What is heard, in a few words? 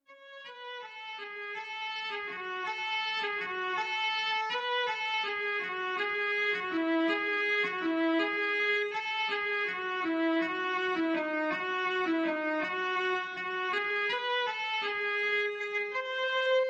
violin